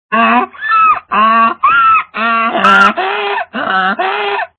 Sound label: Donkeys; midi